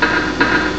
footsteps; warlords; ST-XX Amiga Soundtracker Sample Packs

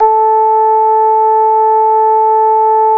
SLAP A5; Boom Da Bass 1; E-MU Producer Series Vol. 6 – Analogia Project

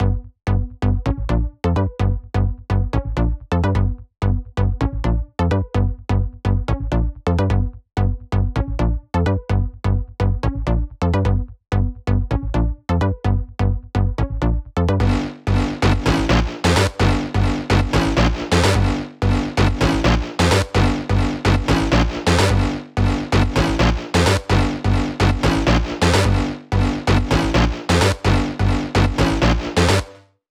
128 BPM Drop Leads SC, Bassline, G; Vengeance - Essential Clubsounds Vol.5; Old College Kit

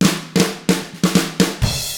HARD ROCK, Drums

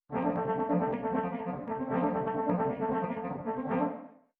this piano broken; random and out of place

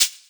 Shaker; Equipped Music; Loungin House

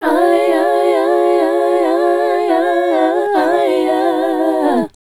Female Group, Vocal

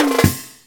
Fill Up; Mo' Phatt; Digital Sound Factory Mo' Phatt

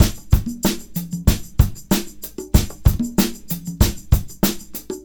Combo, R&B Drums

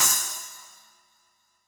SPLASH, Yamaha DD-65 CYMB

